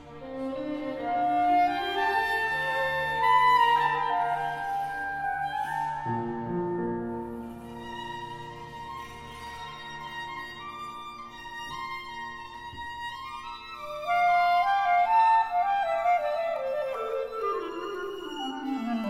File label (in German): Freie Improvisation